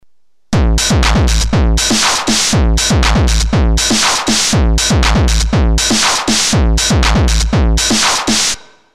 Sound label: Drums Messing Heavy